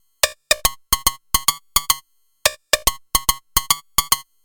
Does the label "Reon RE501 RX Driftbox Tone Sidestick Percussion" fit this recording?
Yes